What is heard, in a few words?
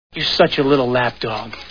scream